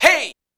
Hey; Roland CR-80

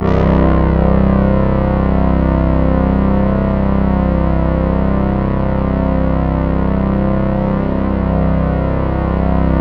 C1, Synth Line; 90 s Sample CDs; NiceBeats; SynthLine VOL-26

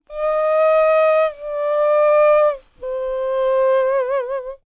HUMAN TRPT, Jazz FX; Spectrasonics; Vocal Planet

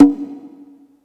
Conga H Open, Yamaha PSR E305

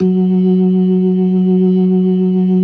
LO MANUAL VIB, Organ

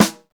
SNR, Roland; Real Snrs

